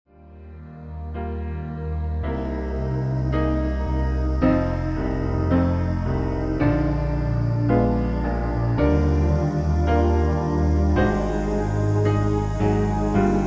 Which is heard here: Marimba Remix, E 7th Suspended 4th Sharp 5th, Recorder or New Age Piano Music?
New Age Piano Music